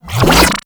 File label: Shock Rifle Laser Retract; Warframe; Weapons; Corpus